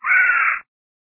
bat wound

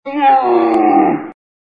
gnoll death

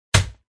cat block

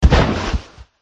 snowman

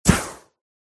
dragon mage attack contact up

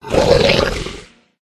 wound; uborg

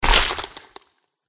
megavolk attack contact bottom

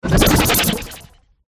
turtle

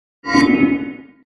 dog buff blue

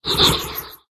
ling wound

